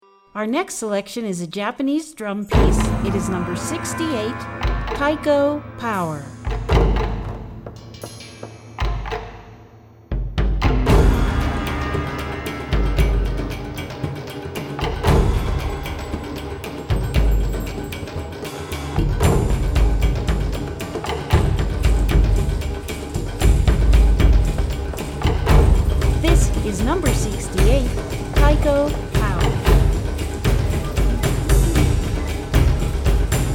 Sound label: Taiko